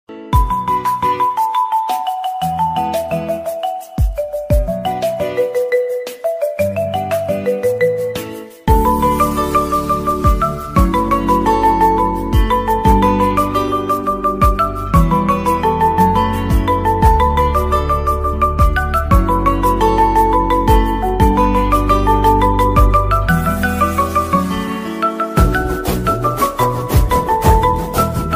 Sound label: flute sound